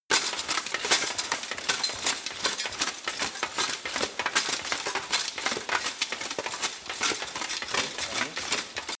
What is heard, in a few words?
run